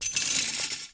chainlink rattle; scripted sequences, a2

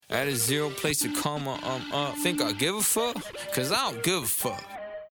lost round, music

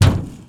metal auto, player footsteps

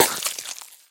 spew, infestation, bulb

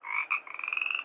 frog

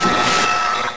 citadel bridge beam; Half Life 2; Episode 1

